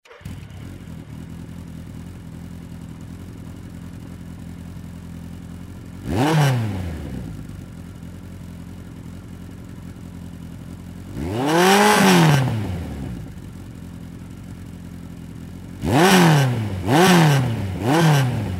Motorcycle starts, regassing, jamming

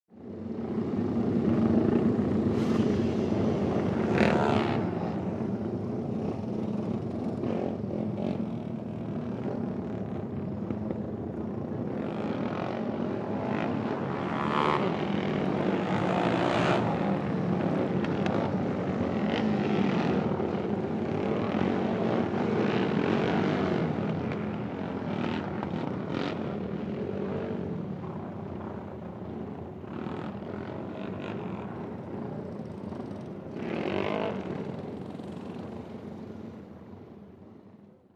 Cross motorcycle rides on the track; motorcycle racing

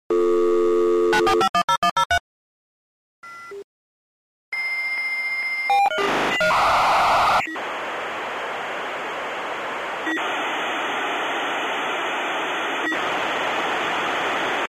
Modem connection, signal, interference; computer

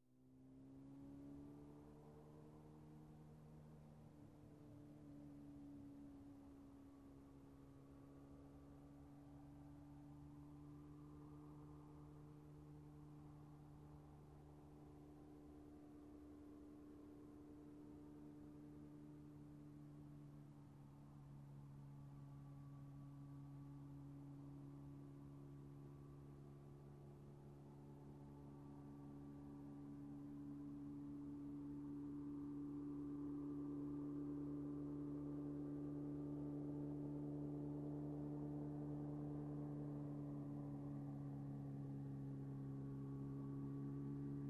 Motorcycle approaching, turning off

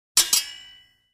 Two swords clash against each other; cold weapons

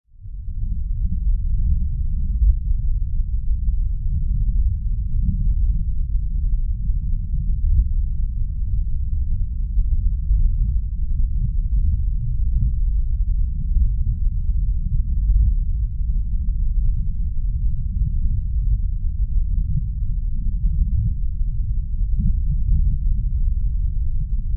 Hum, atmosphere of depth, water

